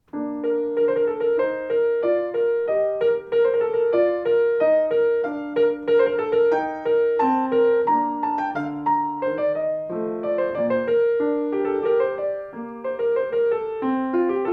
Complex Instrument; Config 2

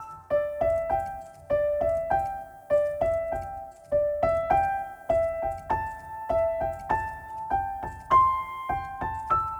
Low Tide 100 C Piano Riff, Ambient Piano Loops; C and Dm Night Mood

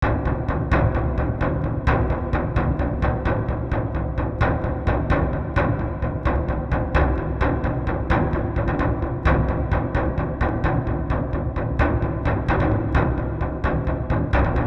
Drums Sacrificial Tension 130

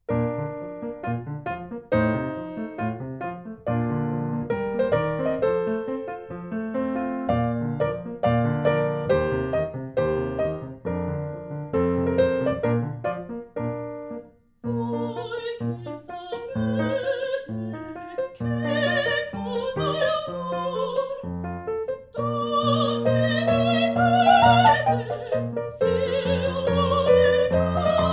Piano Spot Mics; Piano and Singer

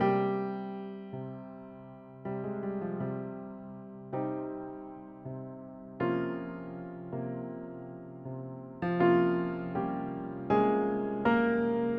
Emotional Piano

